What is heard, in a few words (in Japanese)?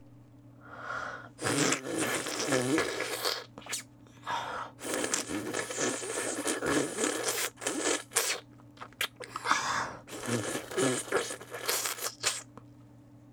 フェラ吸う; 特典エロ台詞